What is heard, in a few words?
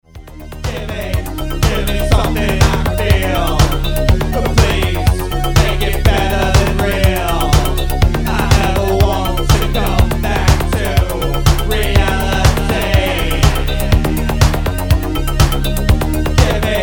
tense